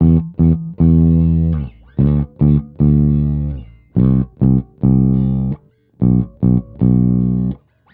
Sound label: 120 Pop 70s Bass Emin, E-Bass; Pop Rock; Pro Tools Essential Loops; Digidesign